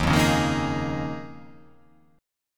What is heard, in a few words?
D sharp 7th Suspended 2nd Suspended 4th